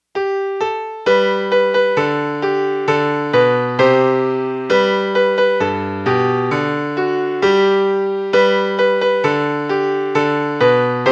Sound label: melody